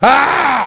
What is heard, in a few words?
dead, male; voices; Pirates Of The Caribbean